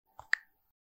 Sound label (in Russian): Звук одиночного нажатия на iphone